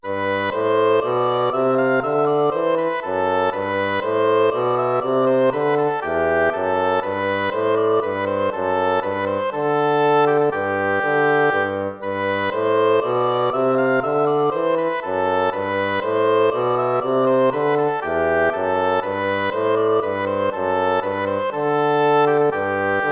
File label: baroque minuet major